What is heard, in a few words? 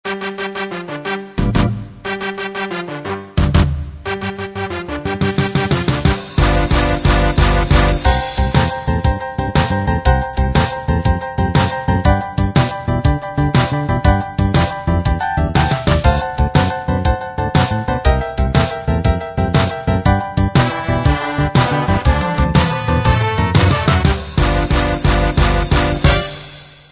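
blues with breaks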